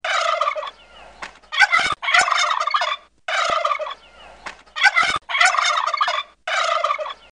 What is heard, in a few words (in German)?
kollern